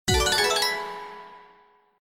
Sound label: Symbol Bonus Win; slots; Wealth Gods2